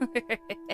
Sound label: evil laugh